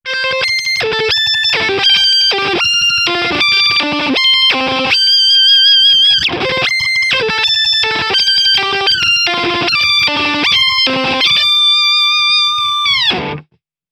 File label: Solo; Archetype Tom Morello